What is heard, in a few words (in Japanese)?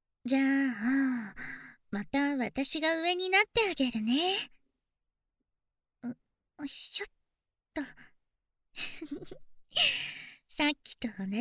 耳舐めセックス; 手加減無しの耳舐めセックス